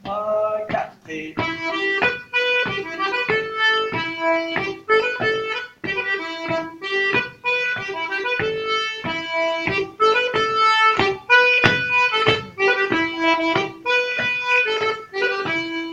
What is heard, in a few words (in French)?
enchainement des 2 rondes; Musique d-ensemble